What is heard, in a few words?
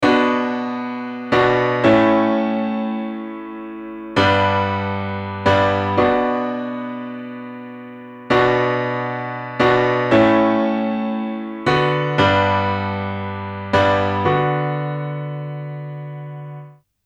piano input gain saturation; waves J37